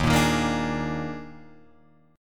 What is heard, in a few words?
Eb Major 7th Suspended 4th Eb, Guitar, Open F; strummed; EbM7sus4